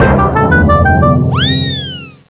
bounce death; Nokia; 5800 XpressMusic; Bounce Touch

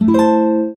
Classic Guitar, notifications

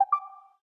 Bluetooth alert; LG Aristo 2 PLUS X212TAL

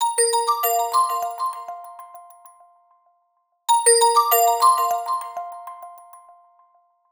Alarm Marimbell; Vivo; E1T; alarms

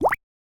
Water Notification Evening; Xiaomi Redmi-8A

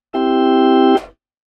Organ, notifications; Samsung; Galaxy Folder 2

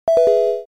Call End; LG SU780 Lollipop 3

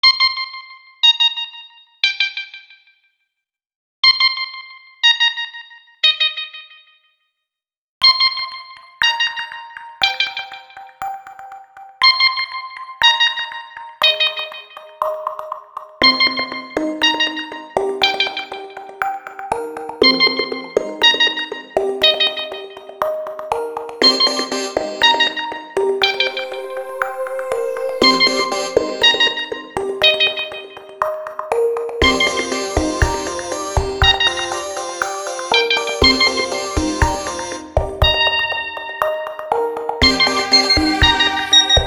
Sleepless dream alarm; PlusFourSix; Brittany; Paul Bergman

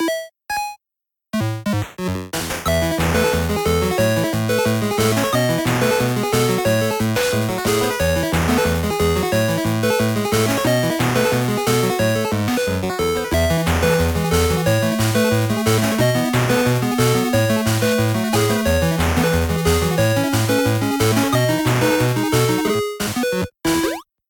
8Bit, ringtones

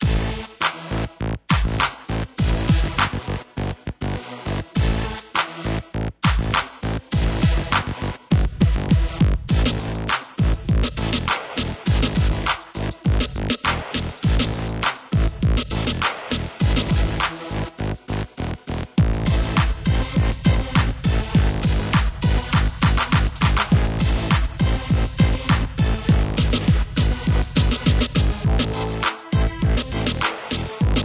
Dubby; Samsung Movie Maker 1.2.06

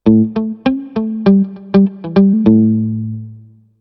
electric guitar, notifications; ZTE nubia Z20

